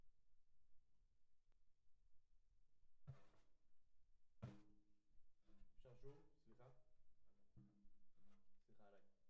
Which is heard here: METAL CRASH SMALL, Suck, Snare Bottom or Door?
Snare Bottom